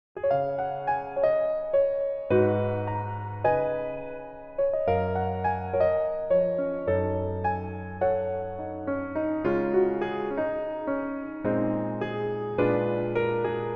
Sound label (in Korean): Piano 멜로디MR